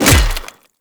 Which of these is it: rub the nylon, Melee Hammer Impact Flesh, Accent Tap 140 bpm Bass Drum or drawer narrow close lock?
Melee Hammer Impact Flesh